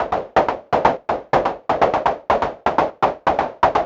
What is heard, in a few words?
124 Fx, Drum Loops 124bpm; Tatanka; Nu Dance Electro